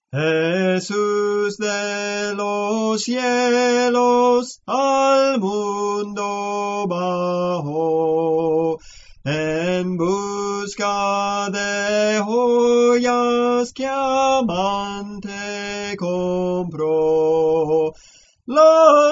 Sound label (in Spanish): Soprano, Cantos Espirituales; Soprano (melodía)